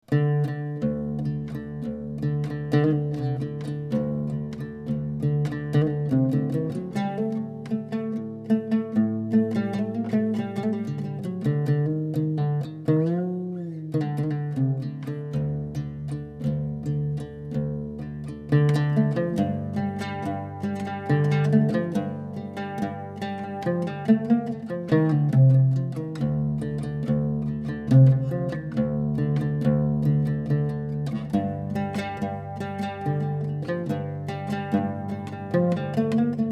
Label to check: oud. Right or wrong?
Right